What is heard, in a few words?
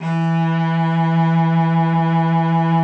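E3, cello vibrato; Xsample VOL-7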